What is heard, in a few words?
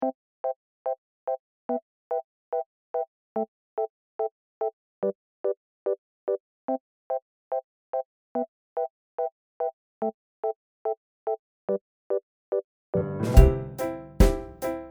backing